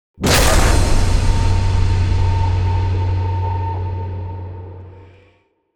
IMPACT COMBO

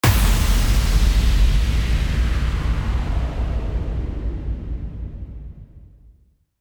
IMPACT

